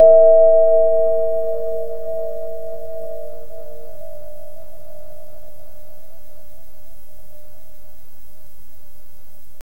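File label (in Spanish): sonido de una cueva húmeda; cave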